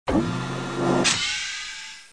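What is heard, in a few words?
door close; Babylon 5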